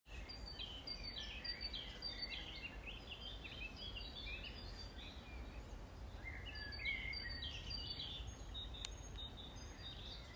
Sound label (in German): Vogelgezwitscher